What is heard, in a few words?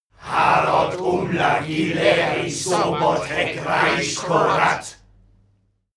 male crowd evoke